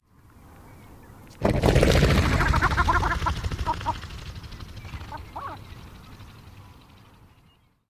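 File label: flight and calls, bird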